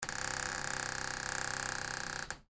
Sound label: door creak